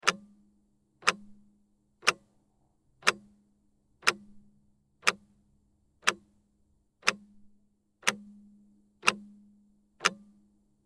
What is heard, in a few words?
Ticking clock sound